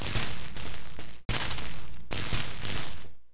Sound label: Sotha Sparks; The Elder Scrolls III Morrowind GOTY; XBOX